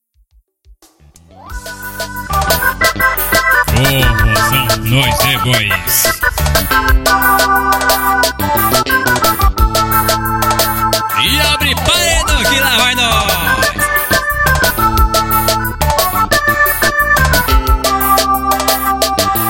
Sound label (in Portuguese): forrozão